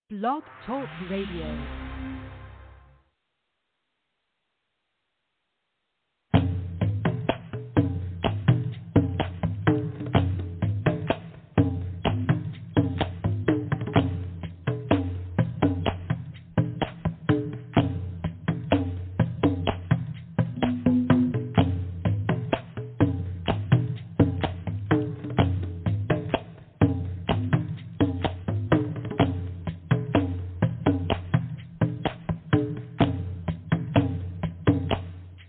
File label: drumming vibrations; truth2power